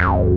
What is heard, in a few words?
TRANS X BS; Pro-Rec - Synthsonic; TRINITY